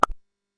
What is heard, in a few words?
click